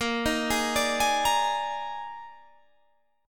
Eb Major 7th Suspended 4th Bb

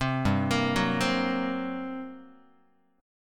G Minor Major 7th Double Flat 5th, G, Guitar

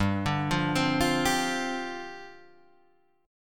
G 7th Suspended 4th G

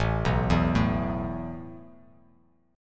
A Suspended 2nd A Bass Standard; Asus2 arpeggiated

